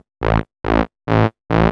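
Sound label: SYNTH BASS DANCE 140 A; eJay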